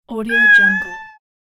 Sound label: meow